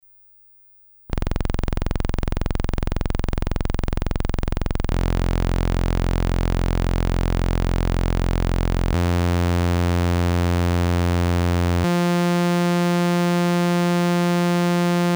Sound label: Korg Volca Keys Poly Mono Osc Test